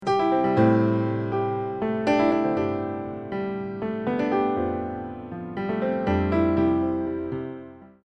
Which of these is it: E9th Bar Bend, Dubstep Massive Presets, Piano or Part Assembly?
Piano